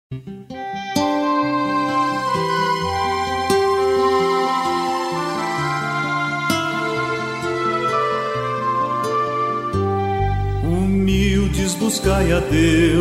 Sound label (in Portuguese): canto trindade salmo